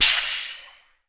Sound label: SHEET METAL, Definitive Percussion